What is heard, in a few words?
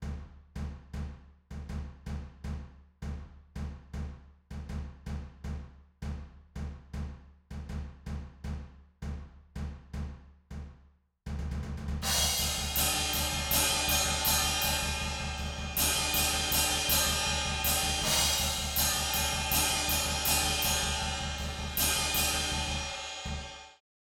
Room Notch Out